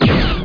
pistol; CD Action; shadow

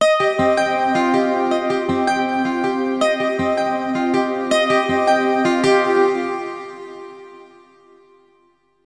ELECGUIT, AMBNT, 160, C; eJay; Ambient; Guitar

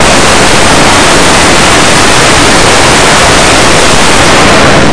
gear

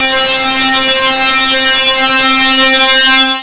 707 rock lead

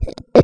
wade mud

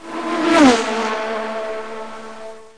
flash

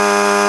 light hover idle; toyspace

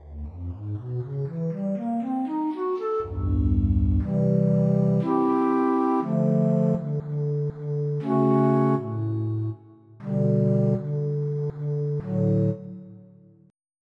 Piccolo; EverQuest

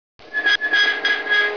METAL CK; Talking E-Mail

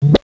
Blip; Princess and the Pea; SoftKey

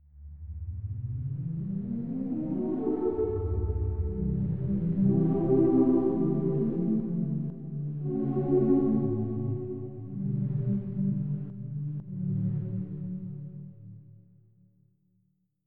filtered voice, choir

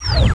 click; Carom

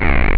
drop, acid

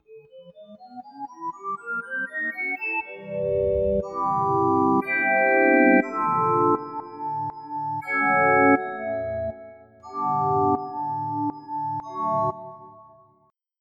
Orgg Doggie; Onj's Soundfont

